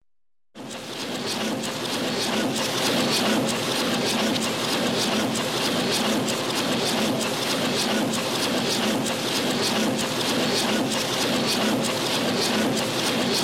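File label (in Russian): звук работы бетономешалки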